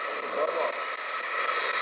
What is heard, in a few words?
buzz off